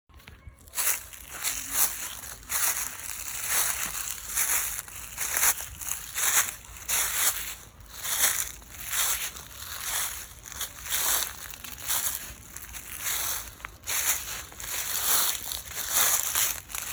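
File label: shagi po suhim listian, footsteps on dry leaves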